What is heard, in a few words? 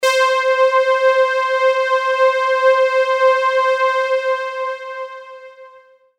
Lead One Shot